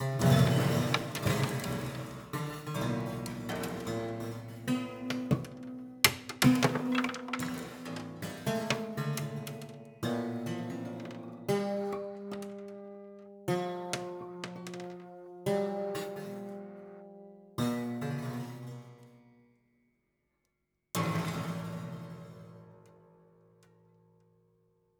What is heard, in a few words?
Marble Dense To Sparse, Piano Destruction